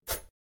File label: shoot; fruit master